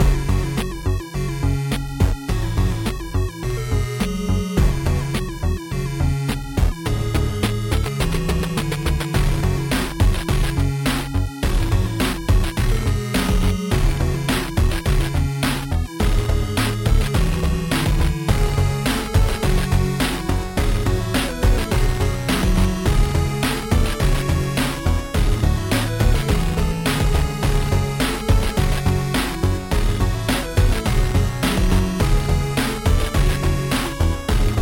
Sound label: Shooter (Alternate) (Minigame)